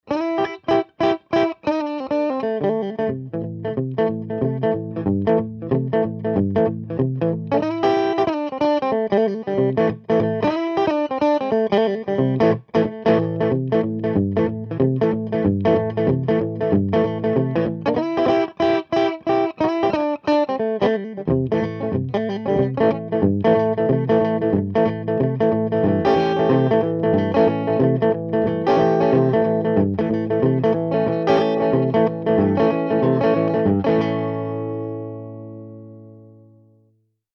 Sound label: Strat Drive; TubeUlent